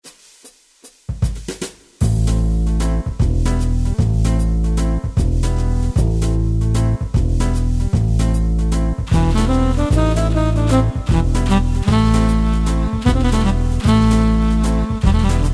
Five-Four, Yamaha PSR-3000, Factory Styles, Swing & Jazz